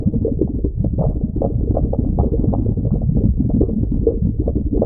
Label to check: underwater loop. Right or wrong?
Right